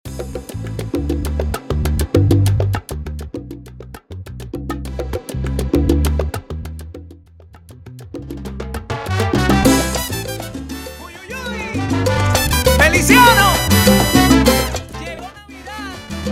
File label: Intro Outro 100 BPM